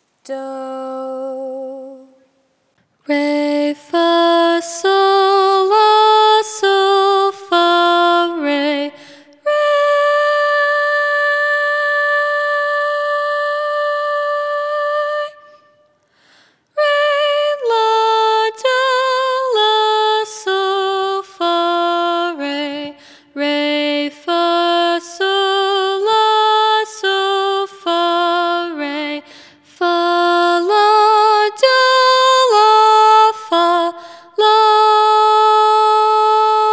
Intonation top starting note

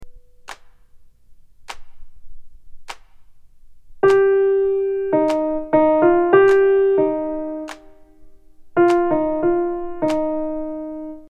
Melodic Dictation

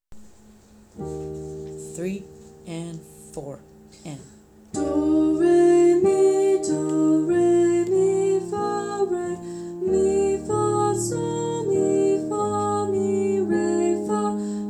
Wee ah Solfege

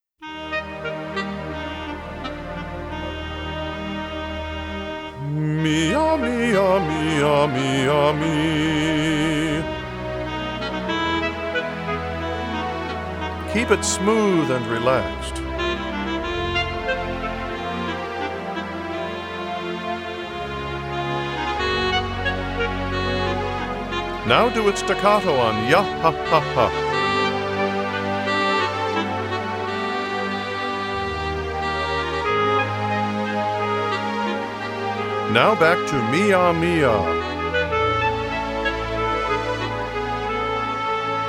Mee Yah Mee Yah Yah Hah Hah Mee Yah Mee

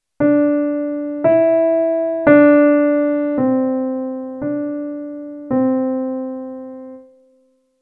Melodic Dictation Pitches Only

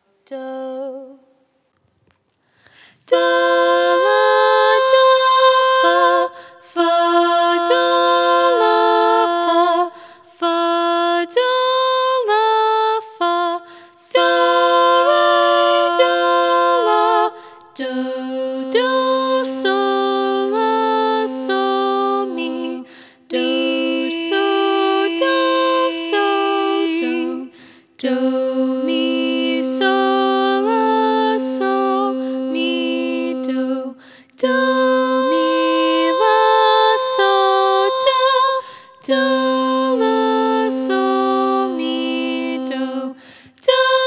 Intonation in C both starting note; Intonation 25-36 in C both parts

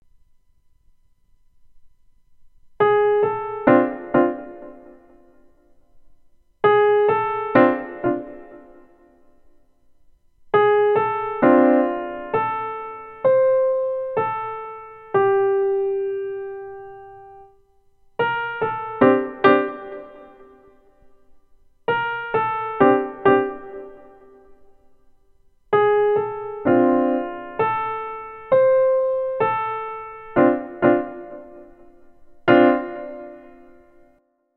Duet Boogie

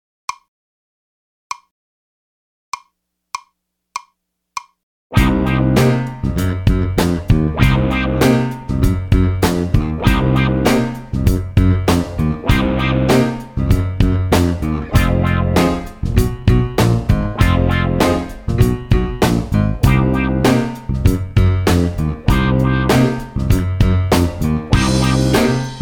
Blues Based Minor

